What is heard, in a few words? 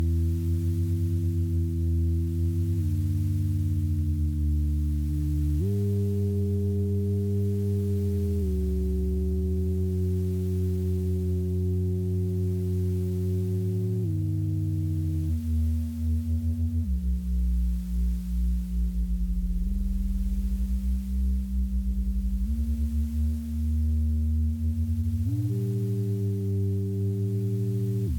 Alien Signal